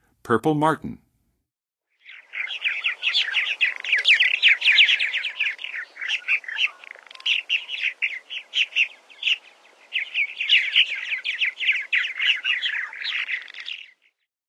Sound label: Purple Martin, Songs of Birds; North America; Western USA; Western USA Songbirds